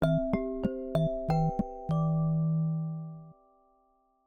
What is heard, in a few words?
Lullaby, notifications; Samsung Galaxy-S25-Ultra; Calm